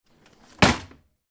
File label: household drawer close kitchen; Drawers; McKinney Sound